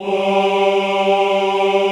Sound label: OHH G2, Classical Choir; Best Service; Extended Classical Choir